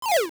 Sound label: Throw; Link throwing an object (also used for jumping off platforms, casting the fishing rod, and Thwomp falling)